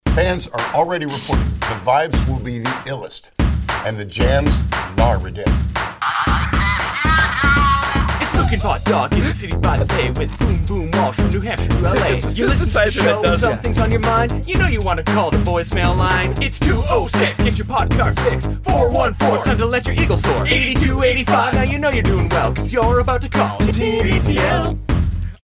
voicemail line jingle; tbtl

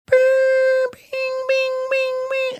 imitating tones used to send fax

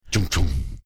making the iconic sound; law order

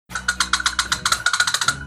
sounds with squirrel call

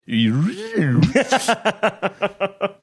recreating funny sound

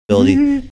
funny giggle

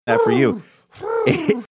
making bird sounds

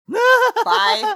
funny giggle

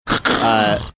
making crunching sounds

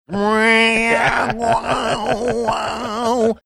making electric guitar sounds

